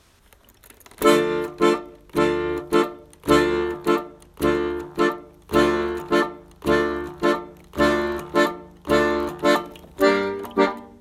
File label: blues basic Dm